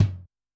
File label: 2 Head Hi, Yamaha DTXpress-II Kick